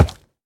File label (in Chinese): 猪灵脚步声